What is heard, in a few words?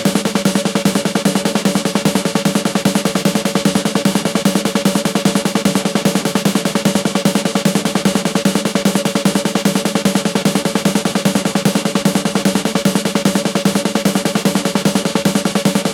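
oriental military war drums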